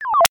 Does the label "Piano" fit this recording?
No